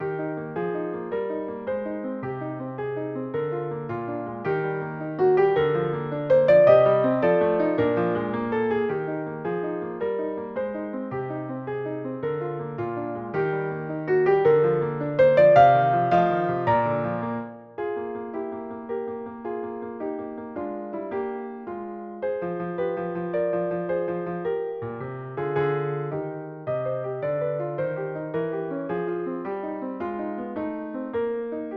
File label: Bluette for piano; synth